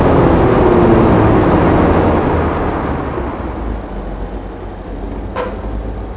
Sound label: throttle off, vehicles; tdmcars